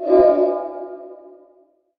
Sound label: enemy specter interact cast